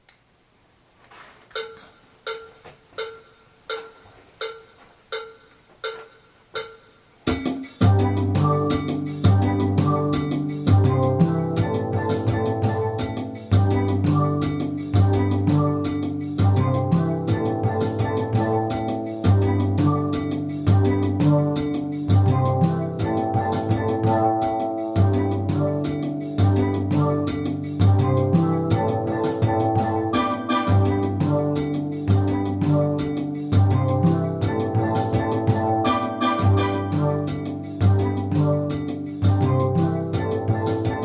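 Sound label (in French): BASSE JAM1 + PONT, Steel Band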